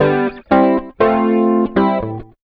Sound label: rock, acoustic guitar loops; USB Soundscan vol.04 - Electric & Acoustic Guitar Loops; AKAI; 120B.ROCK